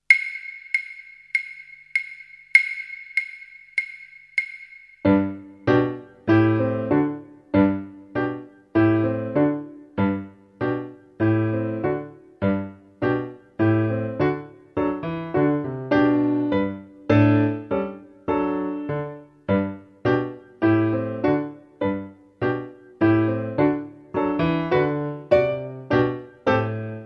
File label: Backing, Violín; Hobby Horses